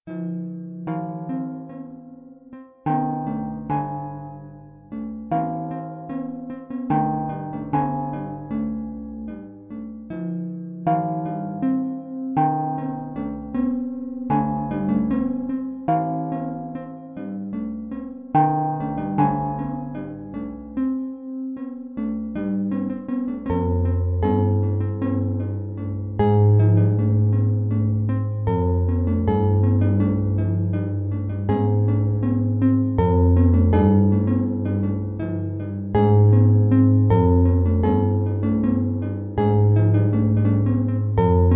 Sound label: Arpa